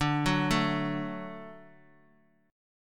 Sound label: D Major D down, D Chord; D arpeggiated; JGuitar